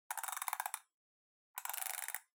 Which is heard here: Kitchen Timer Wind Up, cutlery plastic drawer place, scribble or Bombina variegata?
Kitchen Timer Wind Up